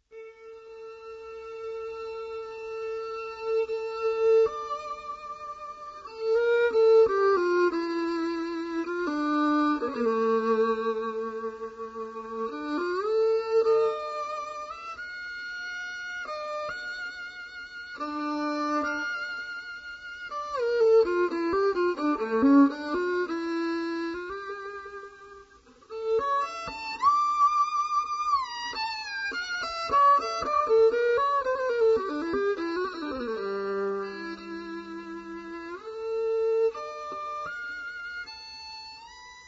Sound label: Violin Doyna